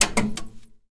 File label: terminal on; S.P.L.U.R.T-Station-13; machines